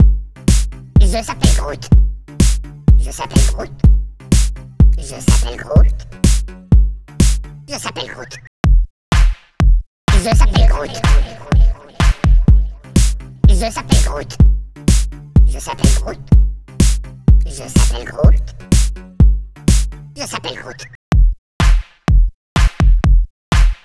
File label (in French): sonnerie; groot